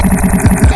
Glitch

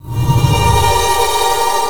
ANGEL VOX R; Big Beat Samples & More; AKAI; VOX FX1 KIT

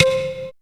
synth c, Top End Tinklers; Dance Synths

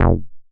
Bass, 2600 F1, Synths, ARP 2600

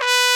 B2, BRASS

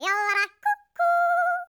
YODEL, World Voices

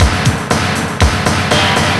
destruction, Deep House 120bpm

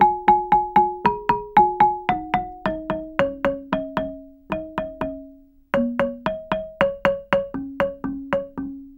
GAMBANG PH; Spectrasonics; Heart of Asia

